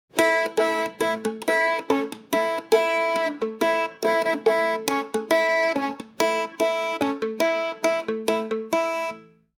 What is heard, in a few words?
zampoa solo folk upbeat